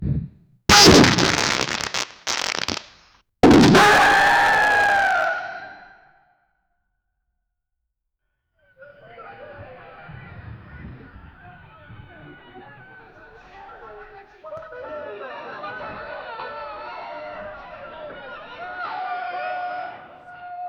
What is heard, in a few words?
farts lots of farts many